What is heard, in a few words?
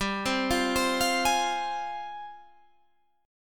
F Minor, chord; Fm/G; arpeggiated